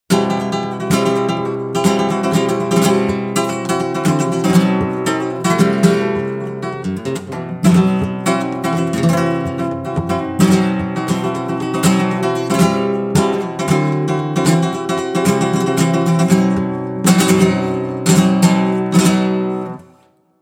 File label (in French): guitare Kremona epicea palissandre